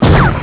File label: en shoot